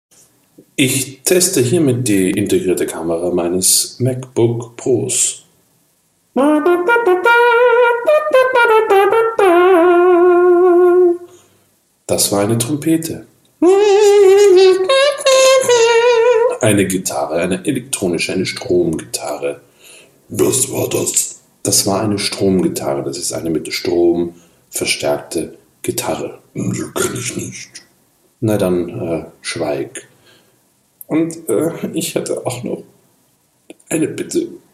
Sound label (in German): Impro Ungeschnitten Und Unbearbeitet